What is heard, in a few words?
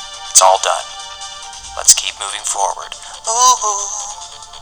huhuu